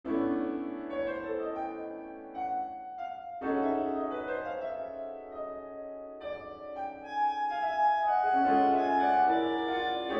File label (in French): pour clarinette sib; romance Baudelaire